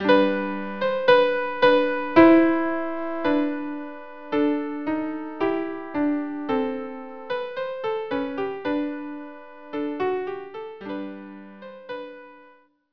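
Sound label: unaccompanied violin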